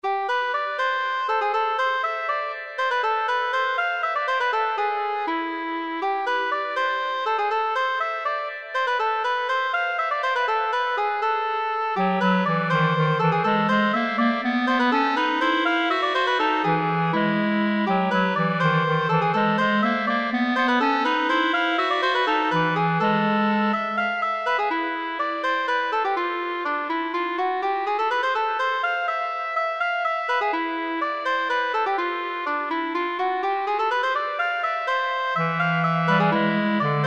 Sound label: Bourrée